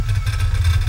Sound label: wheel spin; ui; economy